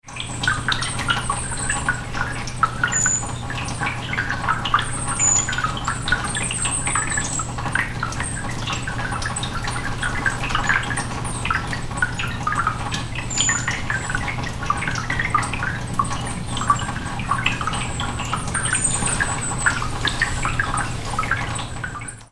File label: Lodge gutters